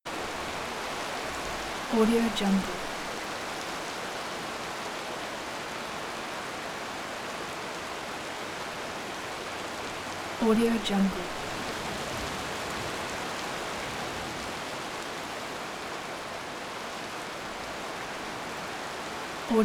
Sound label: rain without thunder; thedreamweaver